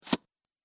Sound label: Cards Card Placed; Card Sound Effects; casino sound effects